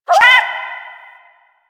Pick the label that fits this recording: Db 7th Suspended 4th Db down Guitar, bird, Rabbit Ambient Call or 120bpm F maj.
Rabbit Ambient Call